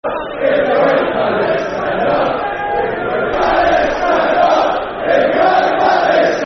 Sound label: Football Chant (Soccer Song), Brighton; Stand Up If You Hate Palace; Brighton Bhafc